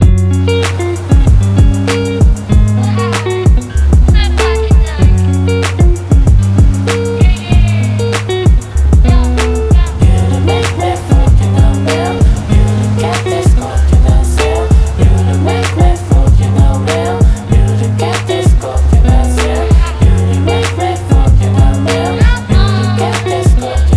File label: lofi beach